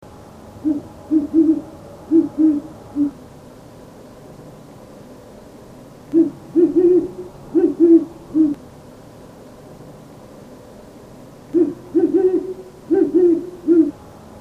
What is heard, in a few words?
Great Horned Owl